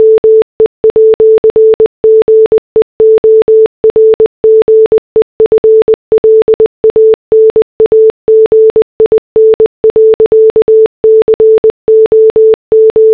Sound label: morse